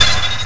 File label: skeleton hit; mazeofgalious